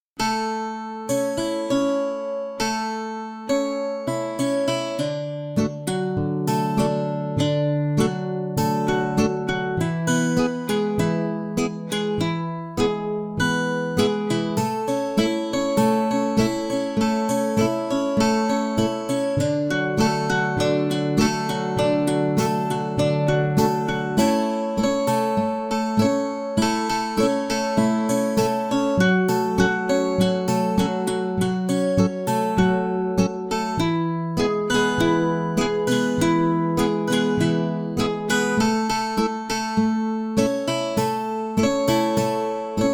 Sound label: Guitar Portuguese Picking Gentle